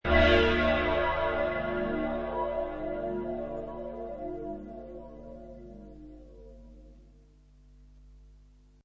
Space Church, Sci-fi, processed church bell; Sound Effects:Sound Design

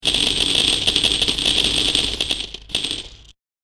Payout Falling Coins, slot machine; Andrew Fleming

